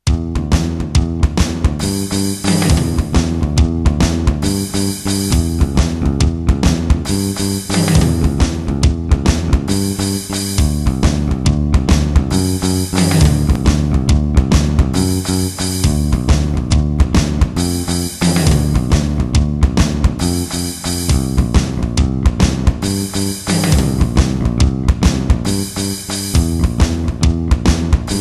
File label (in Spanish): Rock minimalista